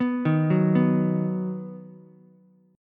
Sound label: Eb Minor Sharp 5th, Ukulele; Ebm#5 arpeggiated; JGuitar